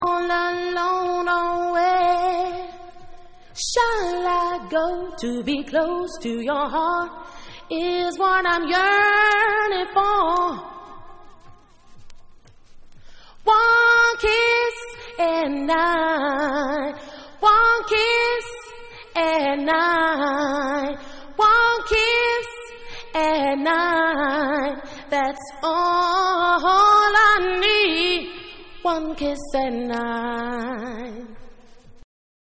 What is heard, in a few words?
Acappella